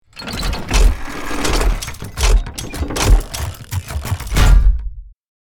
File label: Big cinematic gears movements